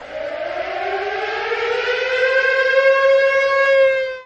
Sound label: Warning Siren; WoWInterface; WeakAuras